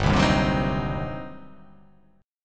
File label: G Major 7th Suspended 4th Sharp 5th, G, down